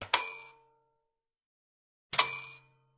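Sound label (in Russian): zvuki tostera, toaster sounds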